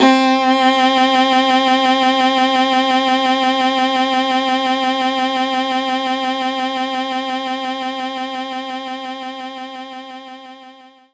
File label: Viola C4; knights try